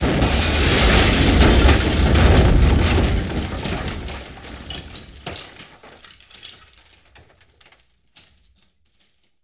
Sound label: Explosion Medium Various Debris; Sony Pictures Sound Effects Series